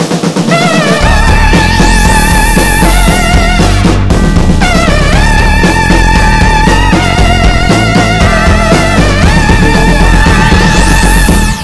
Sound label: end wave